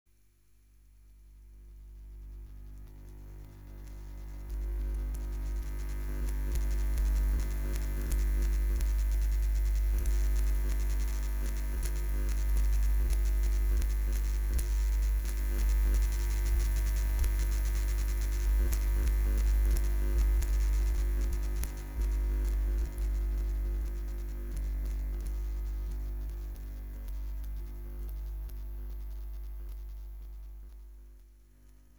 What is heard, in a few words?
Emissions Transformer